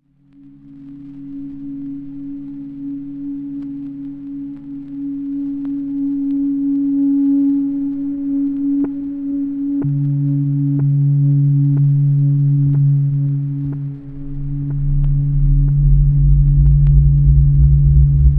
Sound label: drone